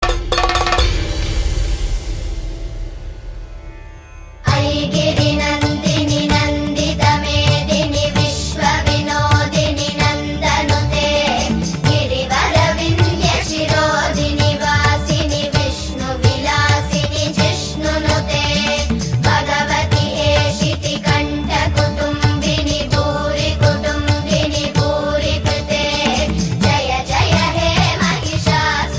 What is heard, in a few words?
Hindu Song Ringtone